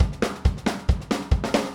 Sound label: 137 Zydeco Swamp Drums, Very Fast Tempo; Pop Rock; Pro Tools Essential Loops; Digidesign